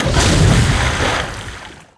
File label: foots water